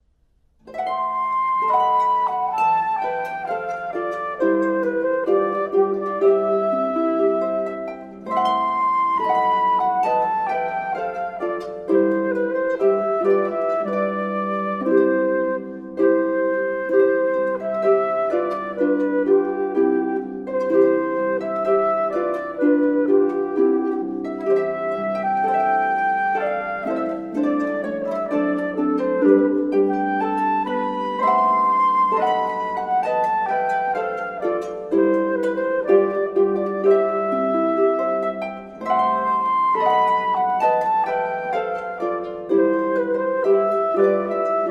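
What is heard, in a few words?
Traditional Wedding March, Harp and Flute; Mendelssohn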